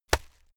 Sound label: hit plastic fleshy hard